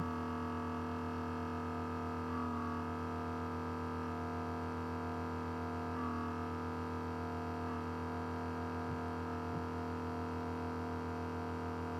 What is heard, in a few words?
macbook fan